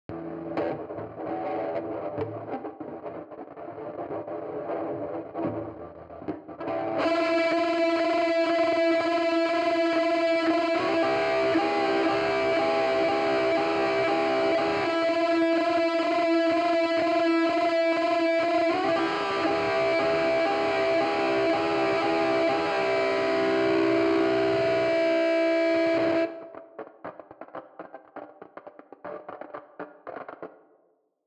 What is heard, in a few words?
guitar